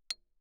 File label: xylophone rosewood ff C8; Percussion